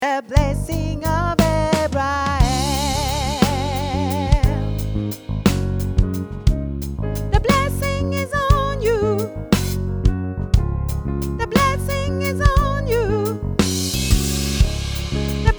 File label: Soprane, Gospel